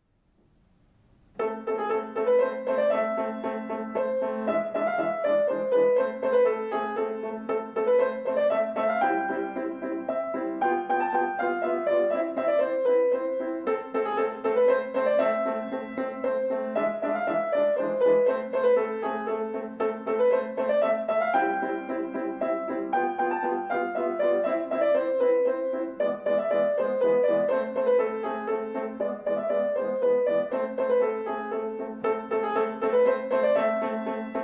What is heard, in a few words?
spanish dance; oesten theodore